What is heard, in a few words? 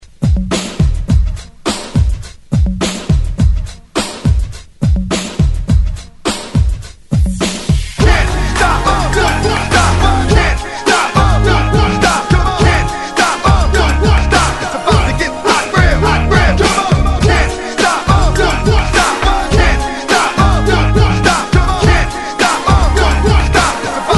90s Hype Intro Outro 104 Bpm; DJDOLLS